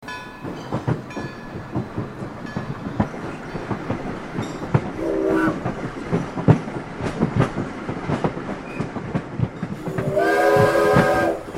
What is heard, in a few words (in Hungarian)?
mail vonathang